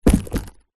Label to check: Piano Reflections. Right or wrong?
Wrong